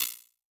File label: Multimedia Internet CD-Rom Flash Click Multiple